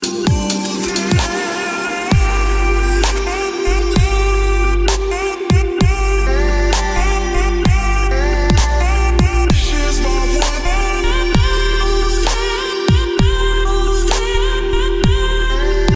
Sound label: edm ringtone